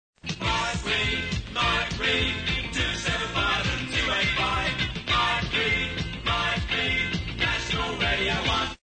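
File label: jingle; mike read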